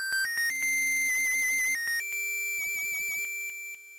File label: whistle; r7 blues